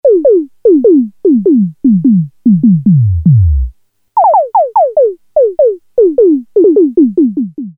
Electric Drums, Prophet-5; Sequential Circuits Prophet-5 (Ver.3); Factory Patch; File 3 Programs

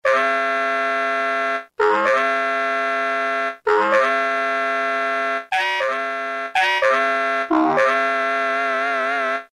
Sax Fx x3; Roland M-DC1

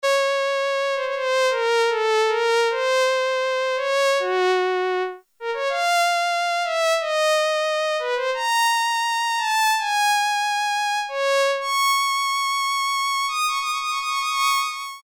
Solo Violin, Korg Poly-61M

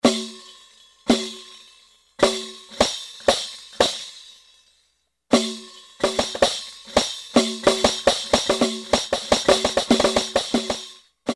Arabic Mazhar, Yamaha A4000 Arabic; World - Latin Instruments; Arabic & Gamelan

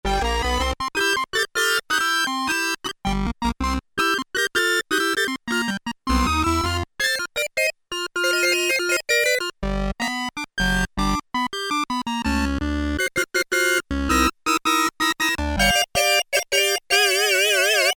Synthe Organ, Korg PolySix; Factory Presets Bank A

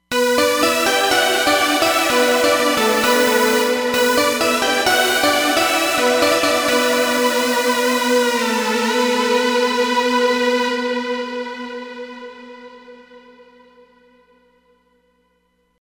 Shining, Roland Fantom XR

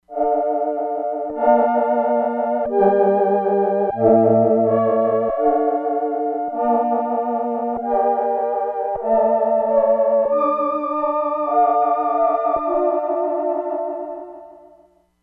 FEMALE SPACE CHOIR, Crumar Bit 99; Factory Programs

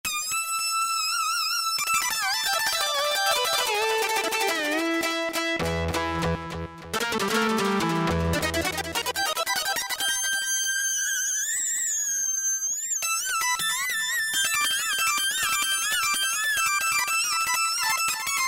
LEAD DELAY LR, Casio VZ-10M; VZ ROM Card

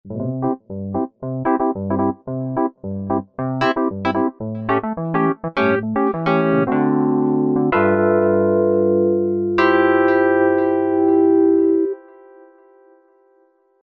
Vurly, Roland V-Synth; Factory Patches

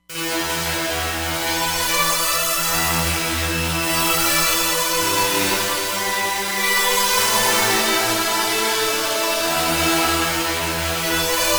Lead, Roland Fantom XR; SBF Lead

